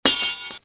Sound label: casing grenade; bullet impacts; Soldier Of Fortune II Double Helix; XBOX